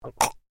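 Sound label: drowning man sounds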